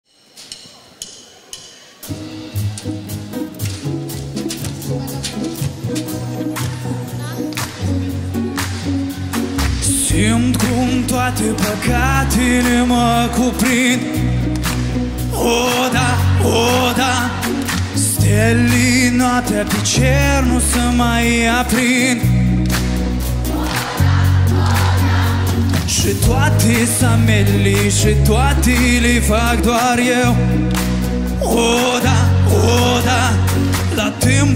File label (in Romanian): live pop simfonic